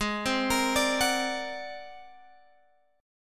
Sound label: Ab 9th Flat 5th, Guitar, Open G